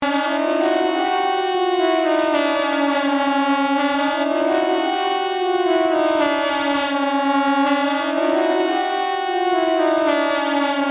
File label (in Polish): sirene